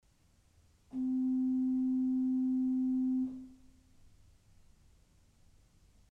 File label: Principal 4' c, orgel pipes